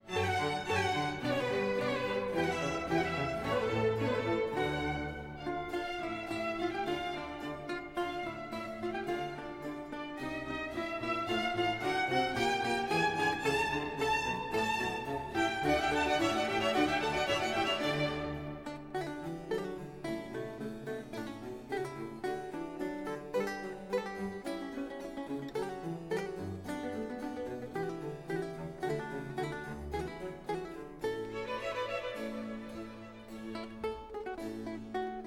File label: barroque